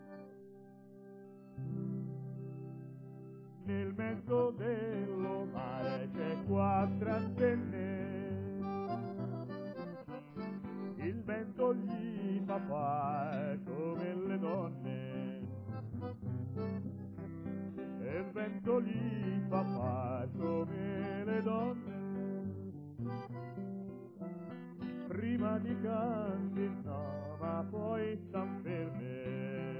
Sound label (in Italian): canti popolari; Nel mezzo dello mar